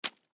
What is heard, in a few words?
Snow Knife Drop Blade First; Weather; Snow Sound Effects; Part of Snow (Sound Effects:Weather); Blastwave